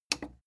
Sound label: plastic button small simple click fast